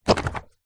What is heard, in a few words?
household vacuum cleaner hose nozzel remove from base; zapsplat